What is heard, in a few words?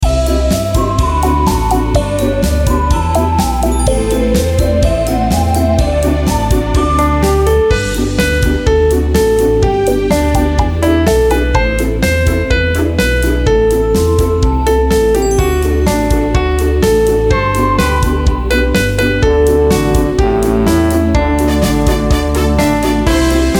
karaoke